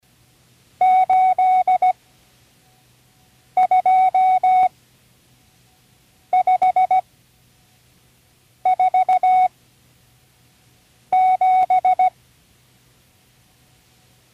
all numbers, Learning Morse Code; Tune in the World

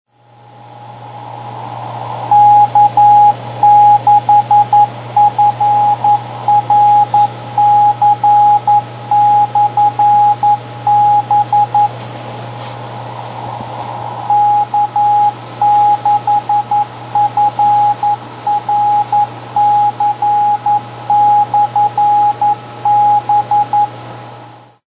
beacon, 10m; K6FRC

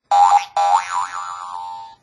boing

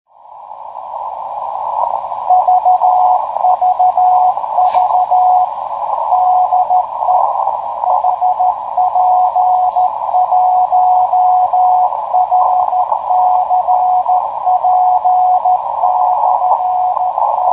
beacon, 10m; hp1rcp

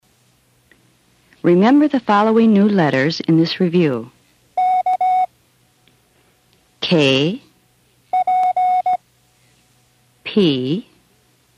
review of KPBGWF, Morse Code; Tune in the World

